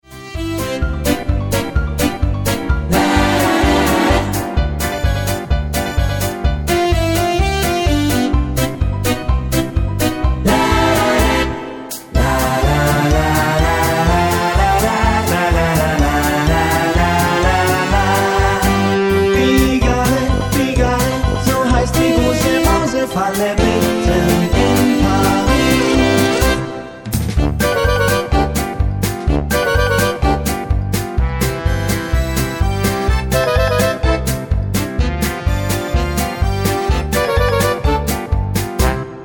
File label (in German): mit Chor; Klang-Fabrik